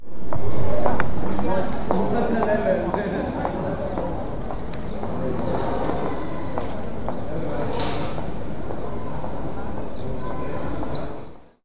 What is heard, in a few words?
Steps